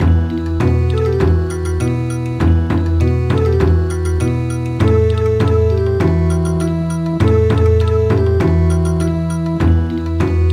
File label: Bon Odori, traditional